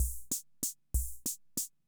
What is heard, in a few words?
Ace Tone Rhythm Ace Loop, Drum Machines; 1967